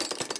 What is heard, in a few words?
spinner slow; boss